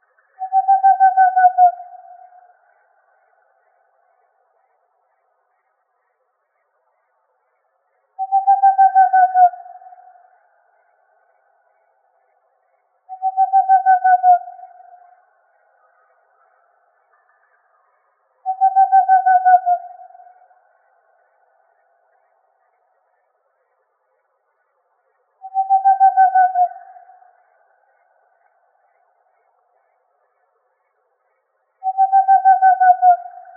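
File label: Whiskered Screech-Owl, Owls; WHSO; deviche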